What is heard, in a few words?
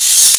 rev cymbal; Ueberschall; Techno Trance Essentials; DRUMS